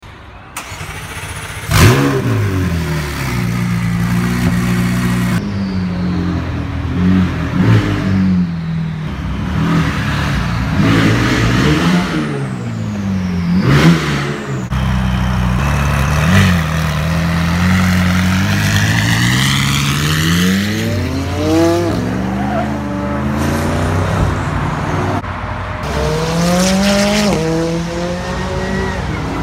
McLaren 675LT

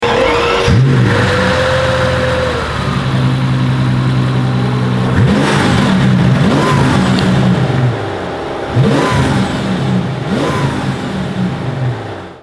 Mercedes SL Roadster

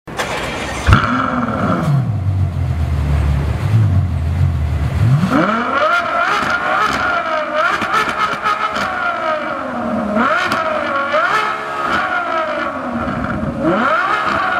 Ferrari FF

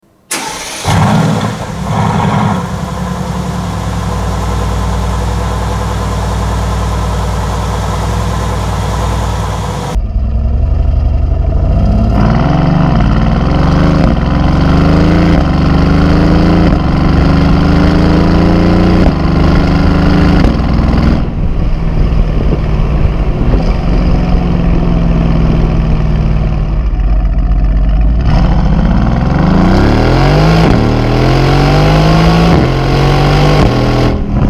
Mercedes GT S

